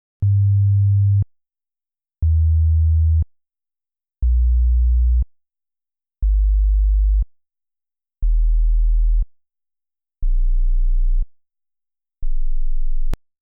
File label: Nexus S Bass sines waves